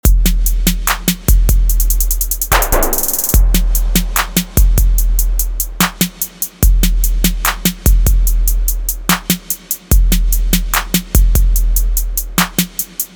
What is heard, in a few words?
SNARE DROP WITH